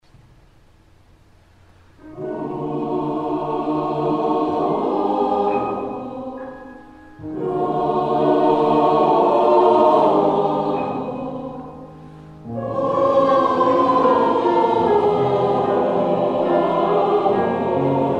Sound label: Lullaby